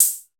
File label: NOISE TRAC1Y, Electro Perc; E-MU Formula 4000 Series Vol. 3; Analog Odyssey